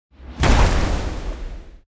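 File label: spawn, monster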